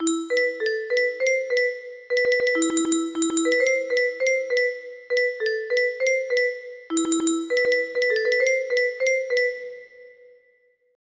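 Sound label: music box melody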